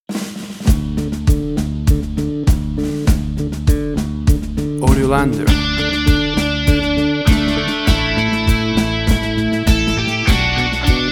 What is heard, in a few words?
Sport Rock